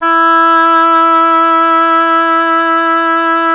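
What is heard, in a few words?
oboe, wind; Miroslav Vitous - Solo Instruments VOL-2; Solo lnst. II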